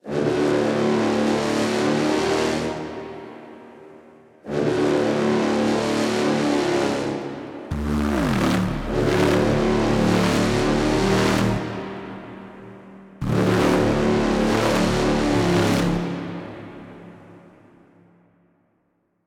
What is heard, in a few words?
water drop; one dog story